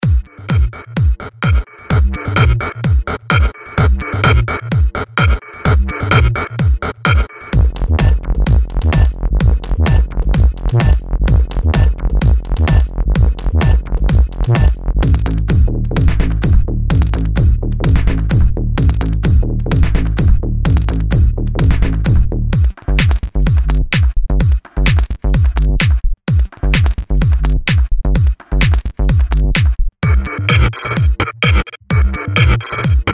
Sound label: tech house tops; micropacks